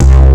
BRUTZLBASS, TWAV BASS; Soundengine - CSR-000 The Art of Transwaves

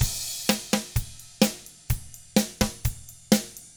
surf rock, Drums; ILIO; Double Platinum Drums 1

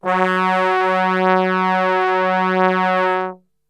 F#1 TRB STR; Brass Super Section; Best Service; TRMBSTRGHT; 90 s Sample CDs

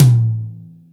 kit, Yamaha Motif-ES8

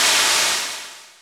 DR110A NOISE; 90 sSampleCDs; Crypton - DrumBase5000; DR55+110+220

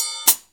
HAT

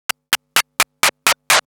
Scratch Fills 140BPM

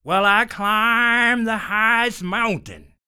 SING, Blues Soul; Spectrasonics Vocal Planet

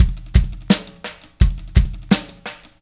Static Beat; Sonic Foundry (Sony Creative Software); Indie Rock Anthems; Session 13 - Static

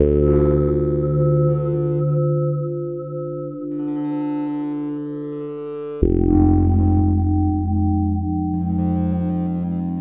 Bass, Futurist Drum & Bass

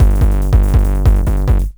DR 909 140, Hard & Loud Techno; USB Soundscan vol.01; AKAI

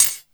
CHH, Drum & Bass, 150 BPM; Ueberschall; Drum & Bass Resonance

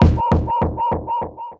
Soft Kick; Esoterik Beatz; PH7 Kit

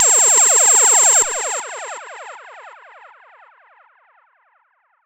Zapps, sci-fi

